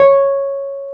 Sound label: PNO T.Wurly C# 6; Keyboards of The 60's and 70's